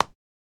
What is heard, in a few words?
step; squares